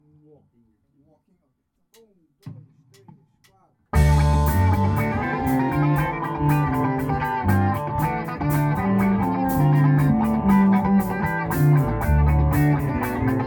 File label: Funky Part